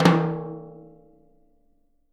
Living Drums, JZ XTOM 1; Sampleheads; Peter Erskine Living Drums

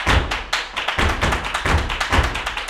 BULERIAS TAP, Flamenco; Best Service ProSamples vol.46; AKAI

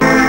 chord, stabs

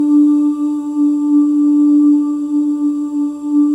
BGV OOS; Spectrasonics; Symphony of Voices

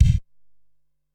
Gated Kick, Ensoniq SQ-R-Plus

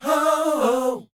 SHOO B F, Vocal, R&B Dance; Spectrasonics Vocal Planet

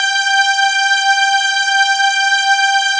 SAW SQ G4

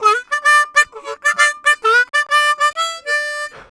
Harm 129bpm G, Harmonica; Quazibeat - African Secret

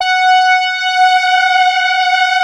FAT LEAD, Powered DX; Wizoo

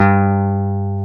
Jazz Guitar; Club-50 - Foundations; Jaz-Kessel

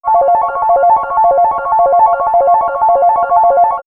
R-Peggios, ambient synth fx; X-Static Goldmine

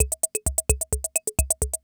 CR78

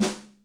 Sheetmtl, Electric Snare, Yamaha DTXpress

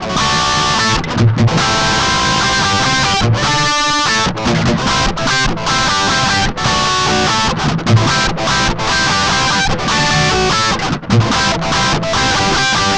Guitar Licks

